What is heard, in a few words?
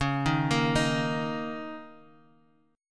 A sharp Minor Double Flat 5th, C sharp